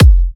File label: Kicks F; markus hakala